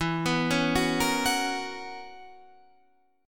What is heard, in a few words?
B Major 7th Suspended 2nd Suspended 4th E, chord shape